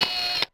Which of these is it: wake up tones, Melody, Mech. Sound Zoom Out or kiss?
Mech. Sound Zoom Out